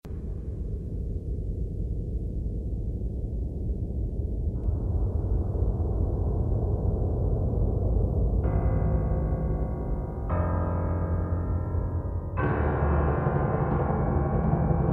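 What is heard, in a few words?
caves, ambience; projectmobius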